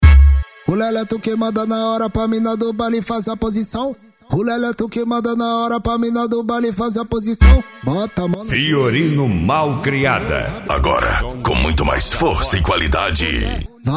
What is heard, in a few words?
mega funk bass